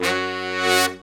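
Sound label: brass shorts, Brass Sections, Horns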